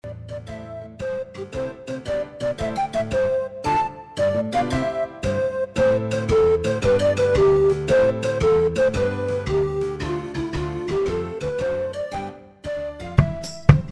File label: Pop midi